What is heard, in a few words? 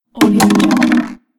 plastic bottle falling